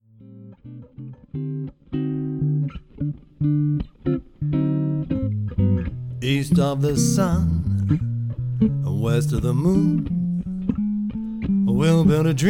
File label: sax guitar